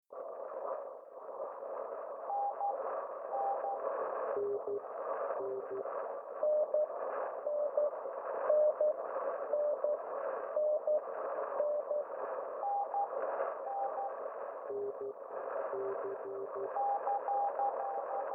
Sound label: beep jam